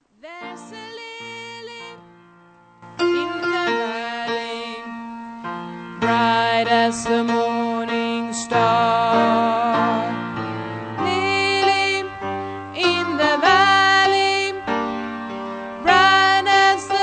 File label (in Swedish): bas, stammor